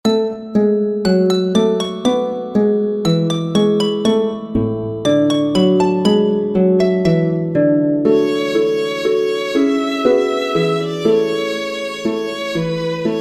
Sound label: Violin backingtrack; Angels We Have Heard on High